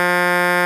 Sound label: HORN; SIMUZEN